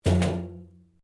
Pipe Tin Move; Metal; Sound Effects:Materials; Foundation3

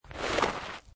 Snow And Ice Slide On Snow; Weather

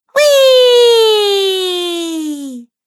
Cartoon Girl Weeee; Cartoon Voice Sound Effects

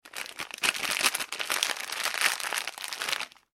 Plastic Packaging Movement; Plastic Packet / Packaging Movement; Materials; foley; McKinney Sound

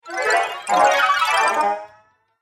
Master Magician Three Wand Waves

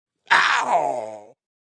Vocal Deep Funk Yell, Funk vocal loop sample.; Loop Vocal Deep FunkYell1 110bpm

